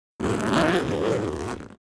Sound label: Large hard case suitcase zipper